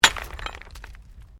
Digging Pick Stones; Various digging tools and processes; Construction Sound Effects; Construction (Sound Effects:Backgrounds)

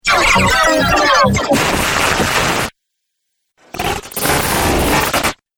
Dubstep Scifi Effects

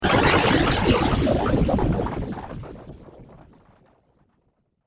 Down The Garbage Chute; science fiction sound effect

